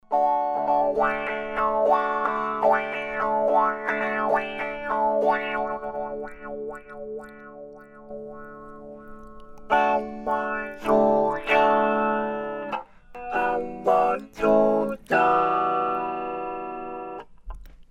Talkbox gitarre akkord; Dunlop MXR M222

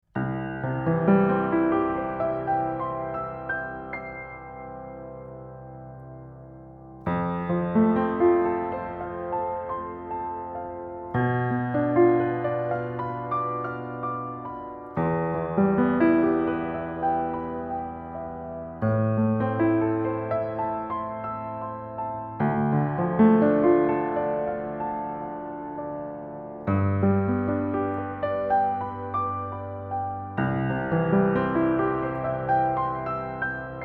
Piano Kugel Metallrahmen Unten LR; DPA 4060

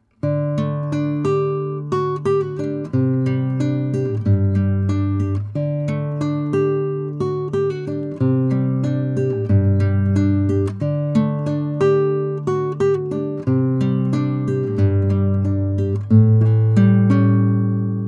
Klassikgitarre; SPL Channel One MKIII; MKH80

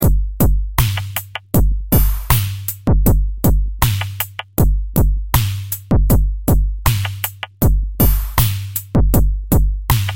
Integrierter Drumsynth

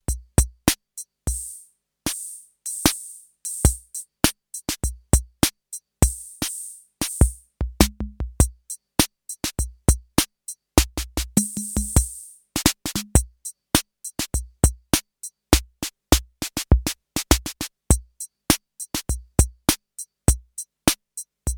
Roland CR-78 Rock 3 und Variations

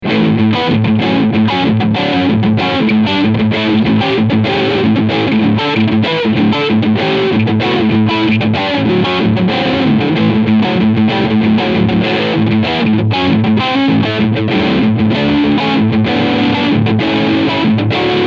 Fender AM Pro II Strat HSS Riffsound SC Mitte SC Steg

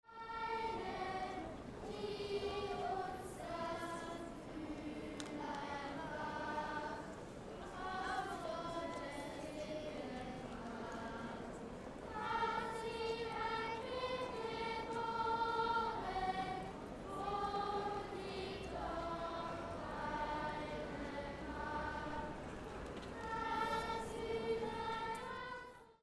Chor; Pocketrak CX

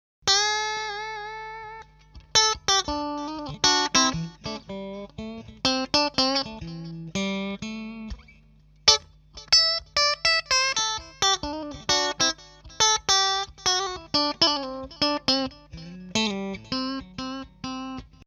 clean steg Mitte

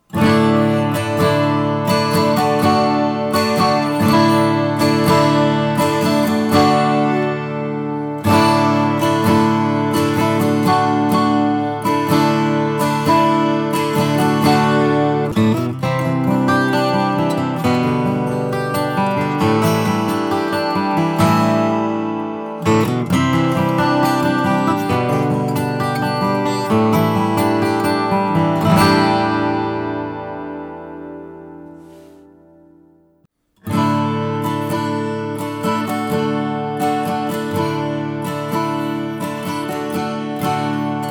Phoenix Audio Ascent One Taylor Preamp Full Output low dann Preamp Low Output High

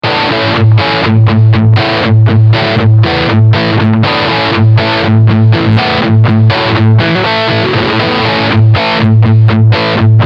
Riff Front HB und SC

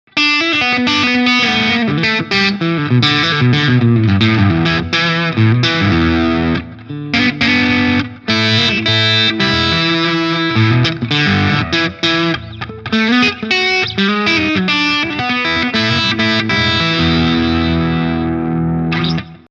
Mitte crunch

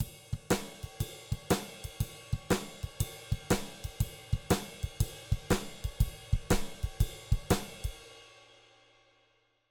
Drums LF35 0 bis +15; RND Portico 551

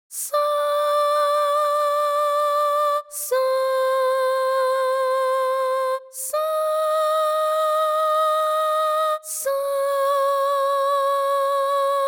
ERA II Vocal Codex Rauhigkeit Verzerrung; celtia

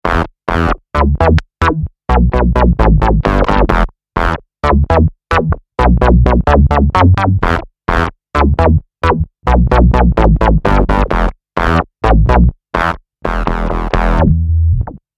Sub + Oct. + Reso + kurzes Filter; EHX MS

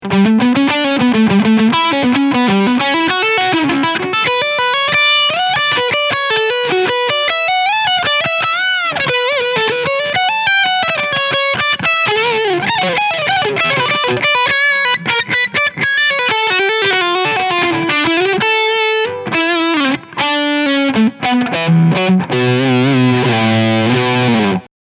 Schecter C 1 EA Classic SVPB Leadsound Steg Humbucker

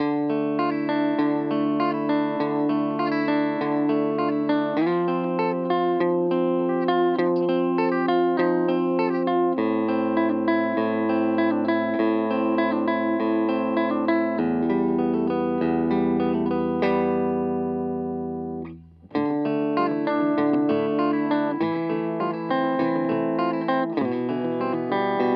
Harley Benton Vergleich HB Clean; Maybach

